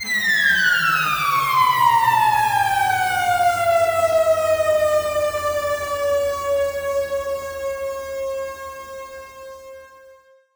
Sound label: Downlifter; FX; Cymatics - Humble Hip Hop Sample Pack